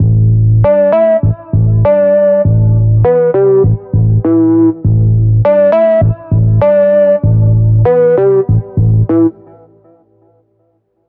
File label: Fluxial Guitar, bass synth; Wave Alchemy - Bassynth 1.1.0